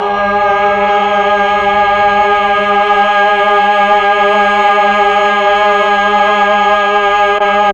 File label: MELLOTRON vox G#2; Big Fish Audio - First Call Vintage Keyboards